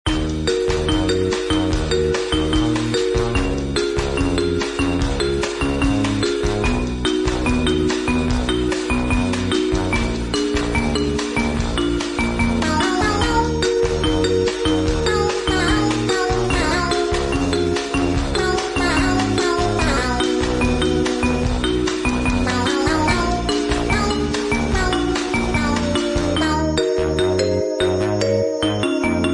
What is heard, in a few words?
elevator music; roblox doors